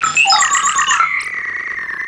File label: robot hit; Earth and Beyond; EA Games